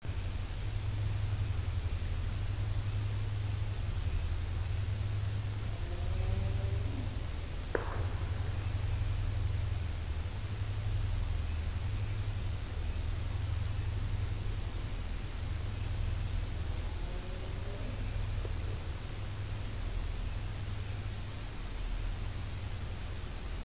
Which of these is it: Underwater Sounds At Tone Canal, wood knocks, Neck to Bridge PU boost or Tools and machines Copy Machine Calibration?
wood knocks